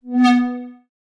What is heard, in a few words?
error